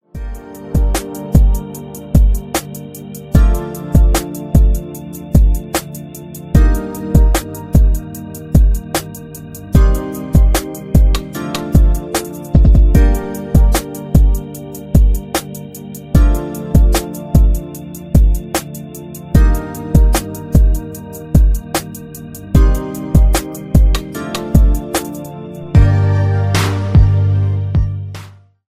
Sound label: iphone 8 melodious ringtone